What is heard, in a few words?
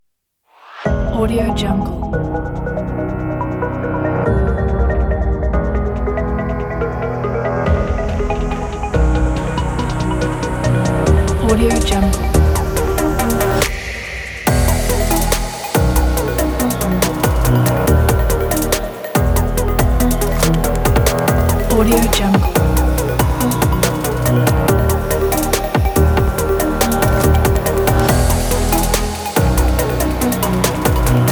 technology background